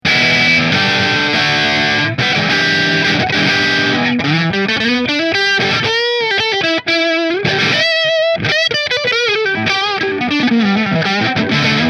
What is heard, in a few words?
Nola Voodoo Natural Neck Marshall, guitars; New Orleans Guitars Voodoo Custom Natural Finish